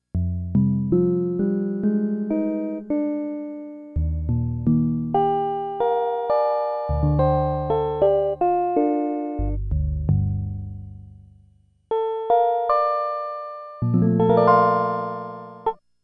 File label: Electric Piano